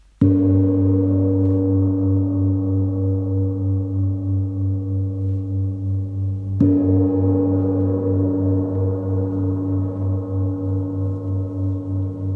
55cm sol Wind

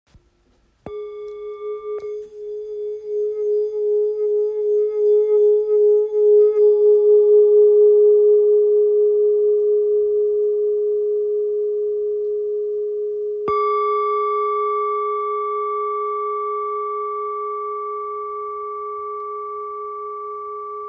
Bol ancien re

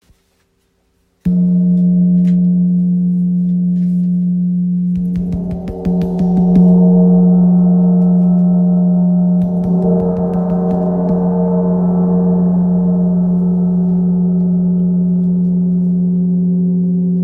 Wind gong fa 40cm

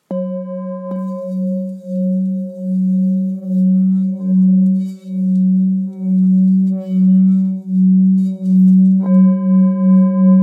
Bengale fa diese

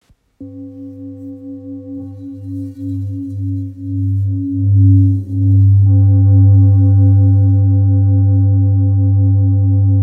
Bol ramgandi sol 1.66kg

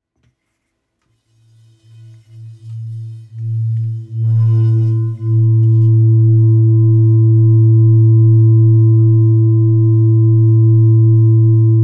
Bol bengale mi

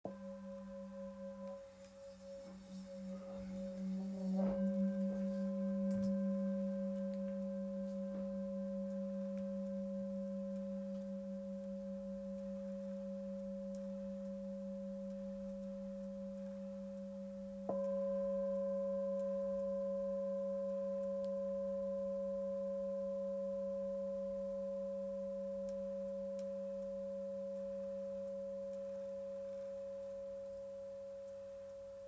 Bol full moon do diese

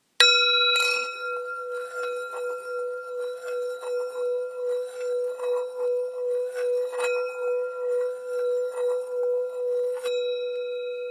Bol bengale fa

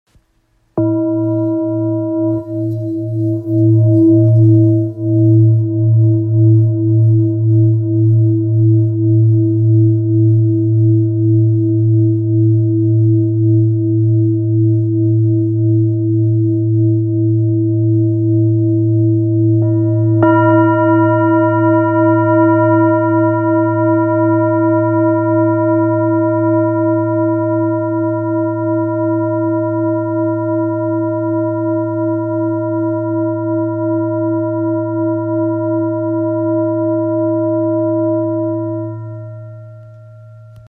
Bol full moon 1.675kg fa diese

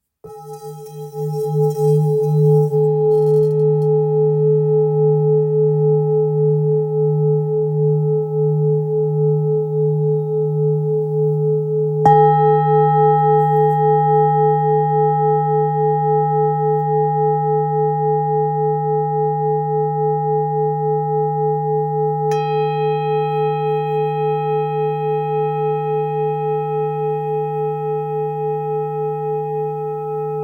Bol ancien re

